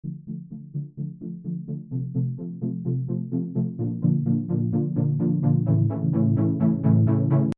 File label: Bpm128 D Epic Lift